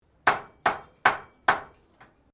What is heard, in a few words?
Blacksmith Hammering Sequence; Colonial era objects; Hammers; Tools; SoundEffects-Foundation1